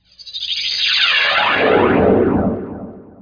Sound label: shrine; Heretic II; items